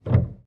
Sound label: piano release